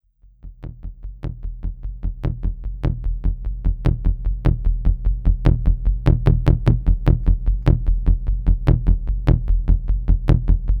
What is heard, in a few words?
thumpy